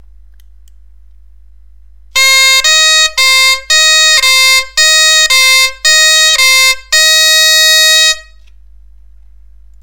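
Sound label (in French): Arpège do mi, bombarde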